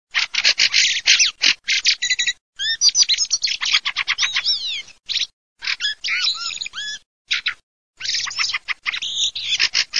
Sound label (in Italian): cesenea